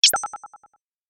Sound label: Error Bleep